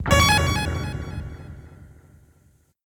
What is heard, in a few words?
Retry Jingle